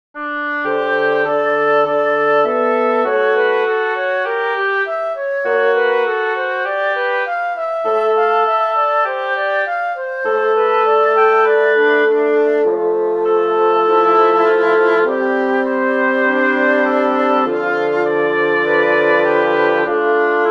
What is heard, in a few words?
Trio